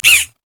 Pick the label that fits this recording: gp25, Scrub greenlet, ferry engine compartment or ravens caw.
ravens caw